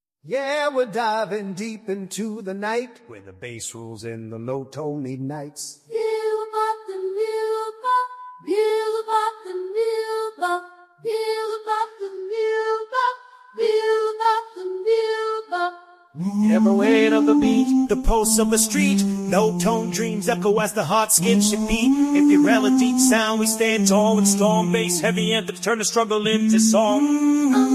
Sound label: Rap Hip Hop Trap Bass Heavy Deep Tone Gritty Urban Experimental